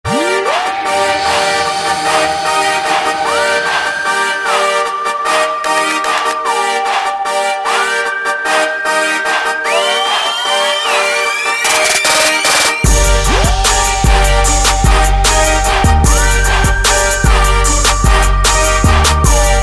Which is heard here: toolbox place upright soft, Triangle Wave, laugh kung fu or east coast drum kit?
east coast drum kit